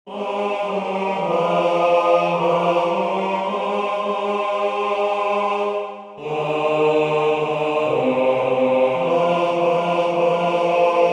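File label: bass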